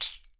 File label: RTHM-TECH, POP ROCK SET